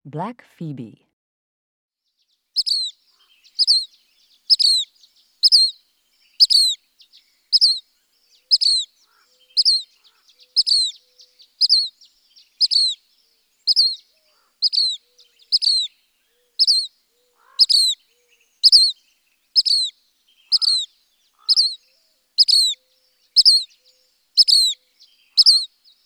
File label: Black Phoebe